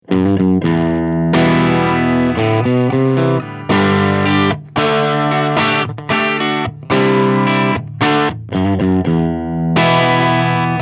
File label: Single Coil Clean; Electro Harmonix MIG 50